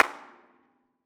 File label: Hand Clap Sample (Shure KSM141 cardioid); Regents Canal Bridge Dalston